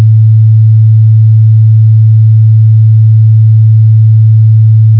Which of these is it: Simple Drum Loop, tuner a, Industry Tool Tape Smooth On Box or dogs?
tuner a